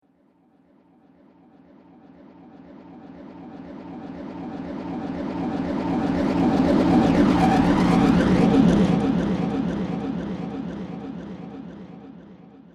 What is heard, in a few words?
Scifi Afterburner, spacecraft; Spacecraft Sound Effects